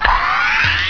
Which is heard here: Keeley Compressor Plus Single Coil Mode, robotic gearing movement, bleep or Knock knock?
robotic gearing movement